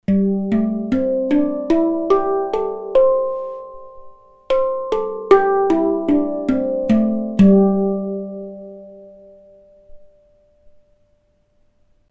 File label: handpan spacedrum c major